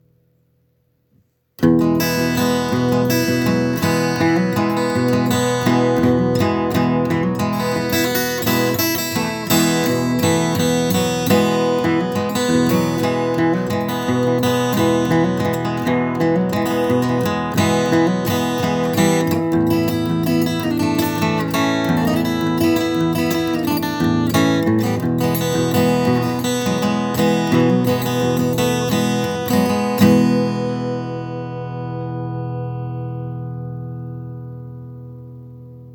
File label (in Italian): arpeggio dei tre mi